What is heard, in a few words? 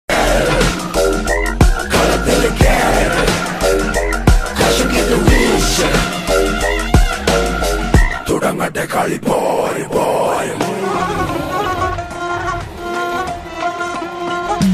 song ringtone bgm; aavesham; fahadh faasil